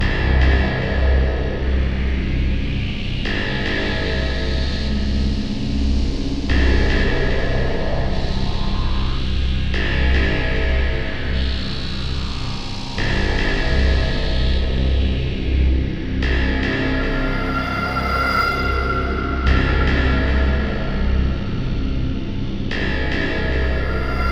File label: Tunnels Night; biomass